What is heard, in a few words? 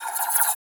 scifi weapon blaster accent multiple